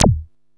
Moog Pro; Vintage Voltage

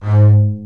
Spicato Basses & Celli

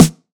909 Snr Sweet, Tape 909, SD

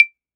Claves; Roland SPD-S

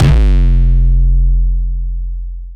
808 Stompie, bass

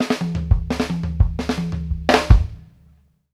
stagger; Sampleheads; New York City Drumworks VOL-1; KD FILLS 84

